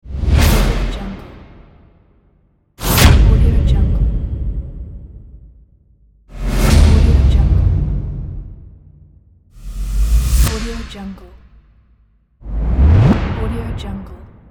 cinematic booms

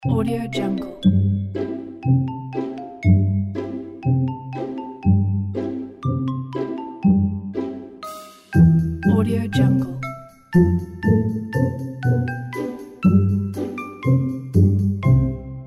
spring carnival

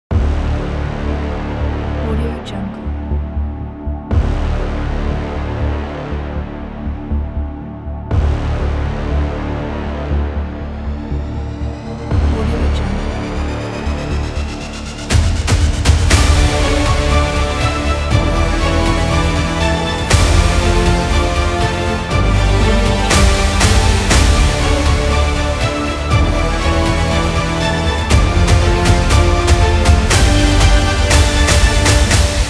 aggressive trailer

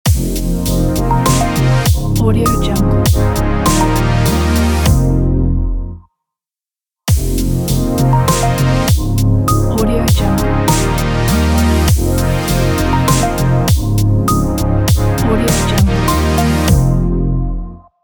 modern logo

